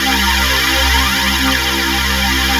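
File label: WASH pad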